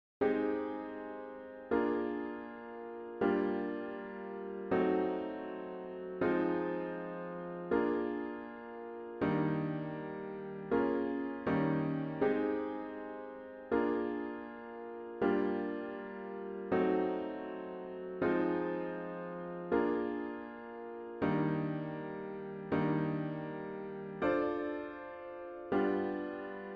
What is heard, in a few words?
chords only